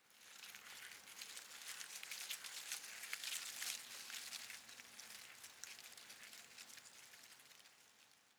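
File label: shrub small leaves rustle soft, garden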